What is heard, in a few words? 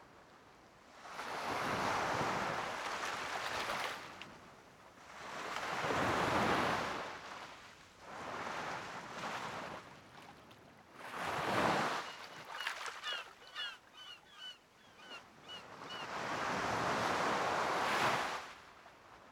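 ambience beach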